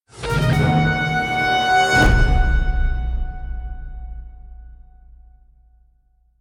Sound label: Victory Stinger